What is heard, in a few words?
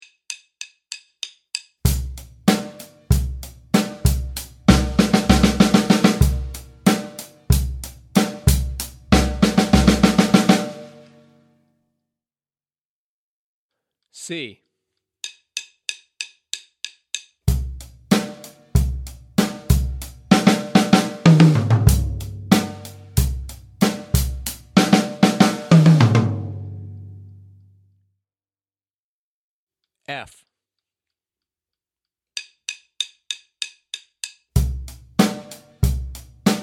Drum Fills in 7-8; jazz drumset